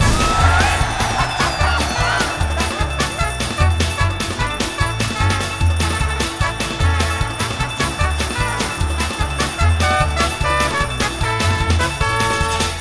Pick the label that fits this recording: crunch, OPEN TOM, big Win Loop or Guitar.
big Win Loop